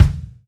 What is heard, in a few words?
Dubstep Kicks